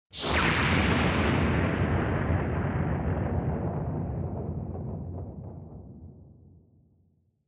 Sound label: Fired Up; Onoma-Fired Up